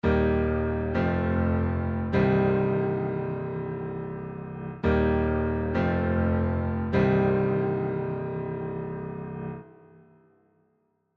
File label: piano; Oxford